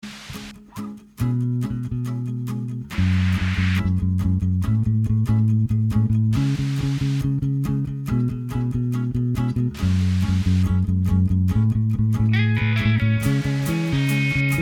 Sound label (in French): Basse